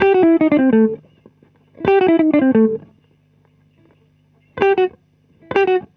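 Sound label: guitar, Roots Disco; USB Soundscan vol.32; AKAI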